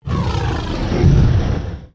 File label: Frostwyrm yes; 冰霜巨龙（魔兽争霸3）; 亡灵; Wyrm Breath; 冰龙吐息; 施加指令